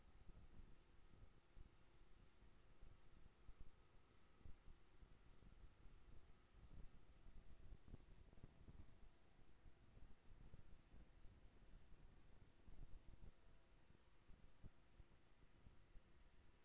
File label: HYDROPHONE 16000Hz; JASCO AMAR HYDROPHONE 740